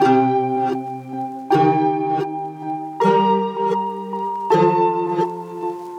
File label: Nostalgic Melody Loop - 160 BPM A# Maj Chords; Loop Stems; Cymatics; 2020 Melody Collection